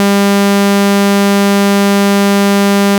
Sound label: saw 200Hz -3dBFS